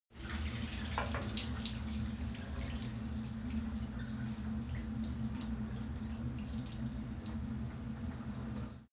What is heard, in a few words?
cleaning machine dripping water inside machine